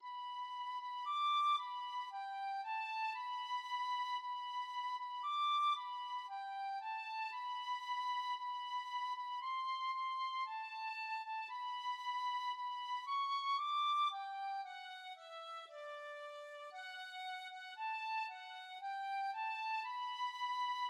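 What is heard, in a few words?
penny whistle, tin whistle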